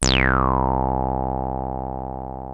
303 C2, TB 303, Synthesizer; Hollywood Edge; Giorgio Moroder Rare Synthesizer Collection

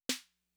Snare Mid Tone Max Snap, 808; classic machines

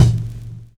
XV Kick, Roland XV-5080

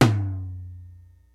LIVE AC KIT, DRUMS; Kid Nepro; Elite

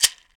Ganza Hard

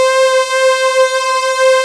POLY BRIGHT, JV 1080; Pro-Rec - Synthsonic

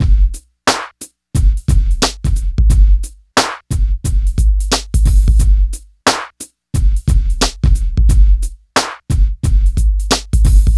drum, Hip Hop and R&B Drumloops

